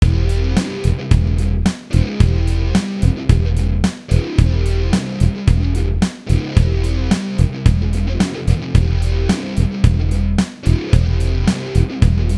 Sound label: drum beats